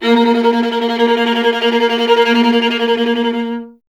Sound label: viola A#; Miroslav Vitous; Solo Instruments VOL-1